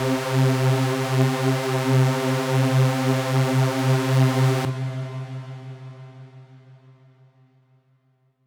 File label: Synth C, Synth Prophet One Shots; Electronisounds